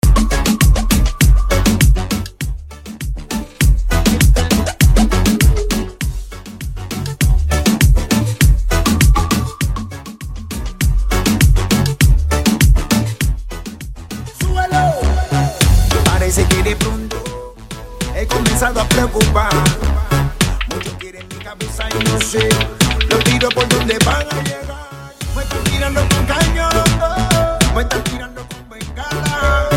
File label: intro outro 100bpm